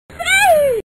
sauuu

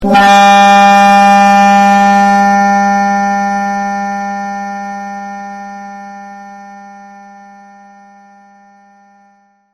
lobotomy sfx

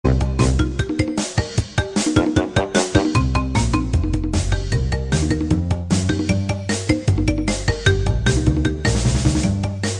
crash

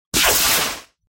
thunder

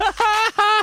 dumb laugh

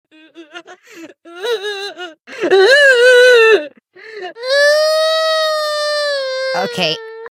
crying; ruby bfdia

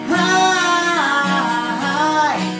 aaaaaaaaai

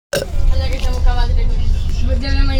burp

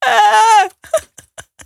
waaaahhhhhhh

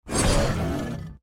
slash

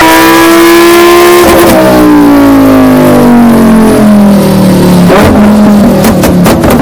spatial lambo enhance

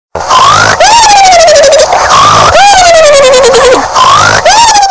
sleeping loud; meme

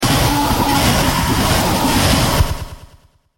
jumpscare; wickerman

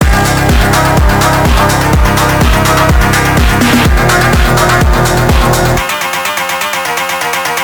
phonk

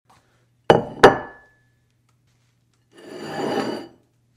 placing dish on table

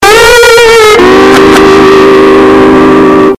loud sponge

